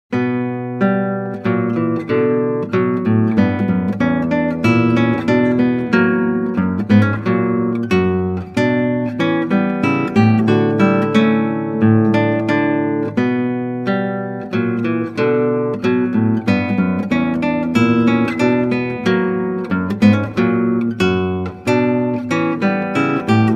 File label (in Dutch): Klassieke Gitaar; Bach 50 Solos